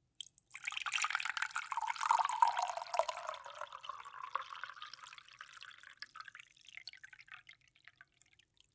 zvuk nalivanija vody v stakan

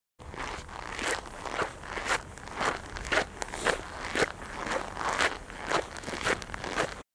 zvuk snega

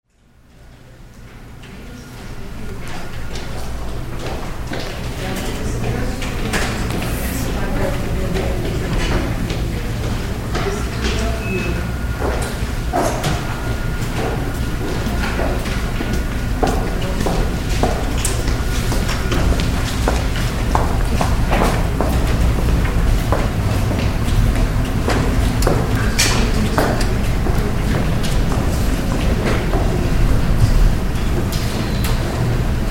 zvuki biblioteki

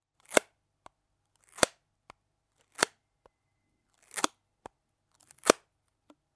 prilipanie

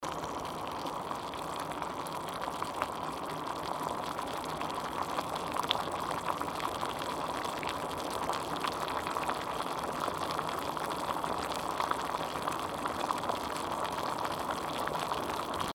zvuk kipenija

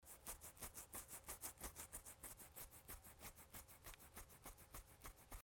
zvuk chesatsja

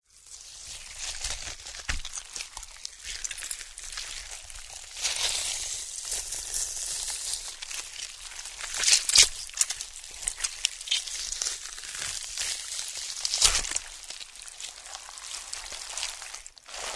zhutkie zvuki

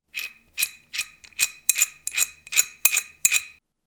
zvuk zakruchivanija

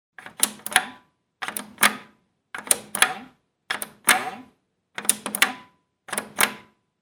zvuk knopki

